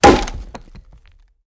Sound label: Axe Chops Wood Single